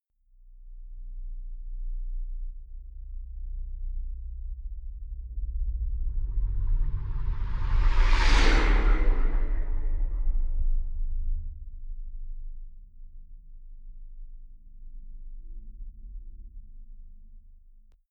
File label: spaceship small flyby loud; raumschiff